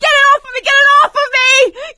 scream while pounced, survivor voice; source engine; left 4 dead 2; producer

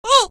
impact; episodic; episode 1; Source engine

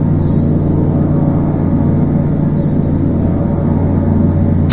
city vent pump loop, machines; ambient; Source Engine; video game

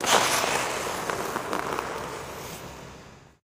taunt skating scorcher stride

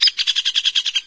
squeek, forest; video games; ambient; source engine; portal2

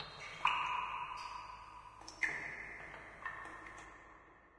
ambient water drips; source engine; portal2

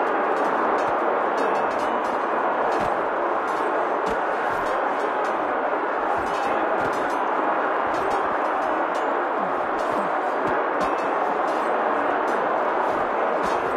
medieval inside, ambient; Source Engine; tf

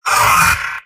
pain sharp; medic; video games; source engine; tf; mvm

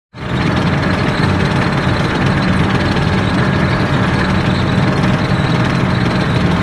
tank forward; video games; player; taunt; source engine; tf

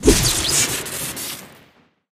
batsaber swing crit, weapons; tf

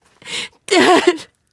dad cry; Portal 2; Source Engine; video games

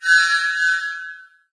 amb rand abstract hi; source engine; portal2

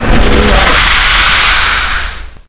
alien door; doors; Source Engine; bshift

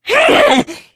pain; Left 4 Dead 2; Source Engine; video games; jockey

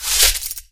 cloth foley; player

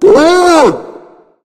charger warn; player; voice; source engine; left 4 dead 2

